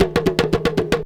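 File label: big tama groove; Best Service; World Colours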